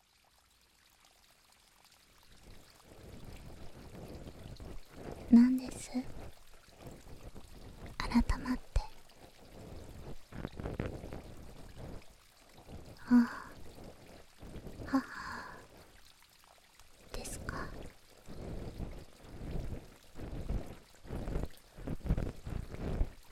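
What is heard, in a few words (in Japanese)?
【耳かき】【BGMなし】, ASMR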